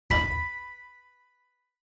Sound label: Quiz Button Single; クイズ ボタン01-2(シングル)